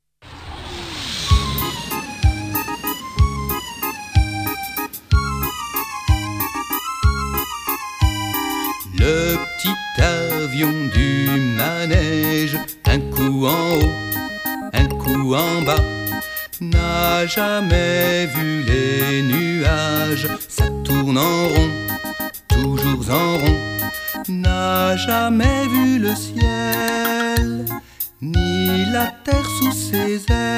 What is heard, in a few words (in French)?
ensemble vocal variété jazz chant du monde